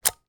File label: household video game controller remove from charging dock; nintendo wii; sound effects; sound effects one